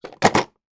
industrial tools wrench adjustable large put in tool box; sound effects one